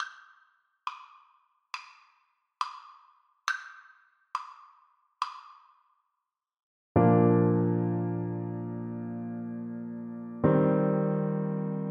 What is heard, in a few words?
danny boy, saxophone